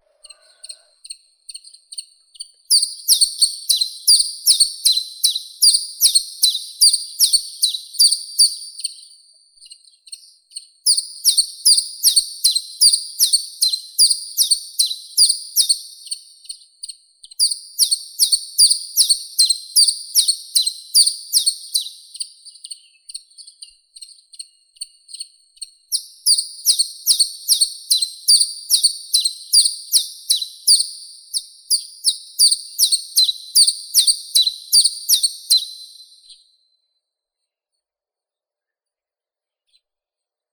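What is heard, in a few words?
chiffchaff, Phylloscopus collybita; penochka tenkovka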